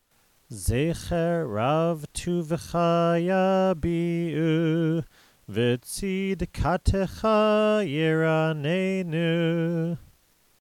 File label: ashrei